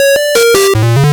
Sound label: Party Time Music Chip Tune Extended Intro